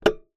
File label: Key Noise, Melodica